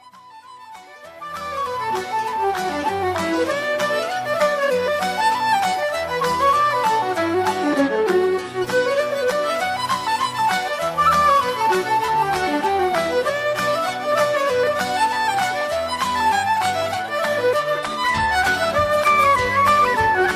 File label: Celtique Irish Folk; Keltisch-Irischer Folk